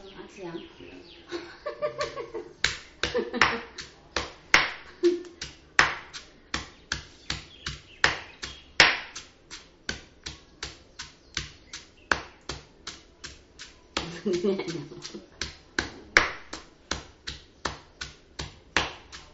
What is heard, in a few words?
pestle